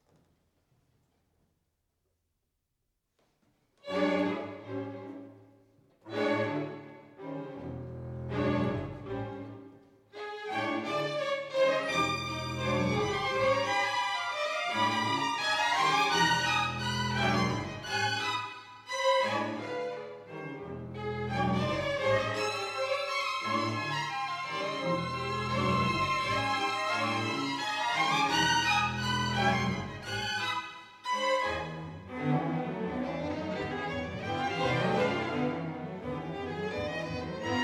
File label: Partita Courante